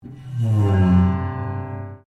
PIANO UPRIGHT BROKEN STRING SLIDE DOWN, Upright piano without keyboard; Pianos (Sound Effects:Musical)